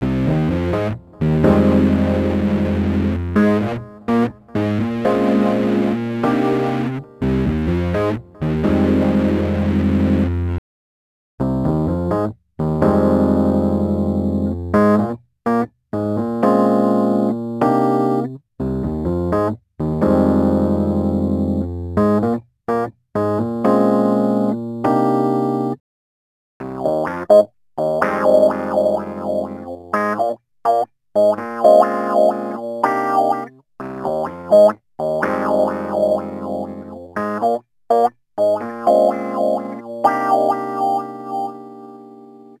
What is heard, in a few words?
Rhodes Attacks; Ueberschall